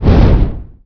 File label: torch